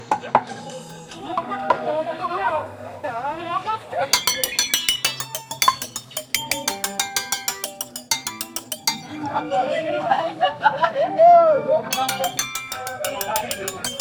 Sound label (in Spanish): msica alegre relajada me; campfire